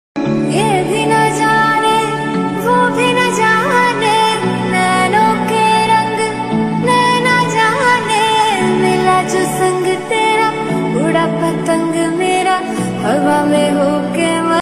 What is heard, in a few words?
ringtone lofi ringtone